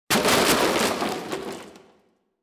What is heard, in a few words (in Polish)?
trafienie dziura